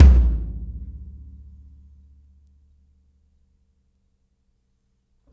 Kick Shard, Processed Drumkits; Sonic Foundry; Zero Gravity Beats; Shards

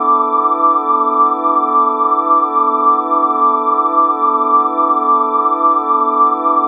Pad

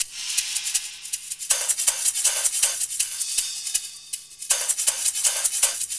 Percussion, R&B Drums

